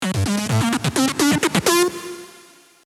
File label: SYLENTH MIX